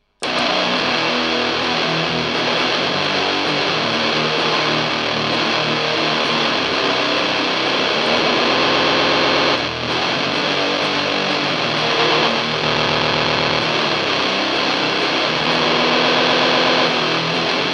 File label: doom fuzz; superweirdo